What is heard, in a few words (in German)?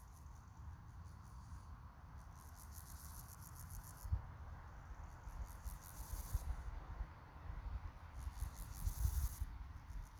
dorsatus, hinten parallelus